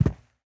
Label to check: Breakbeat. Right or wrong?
Wrong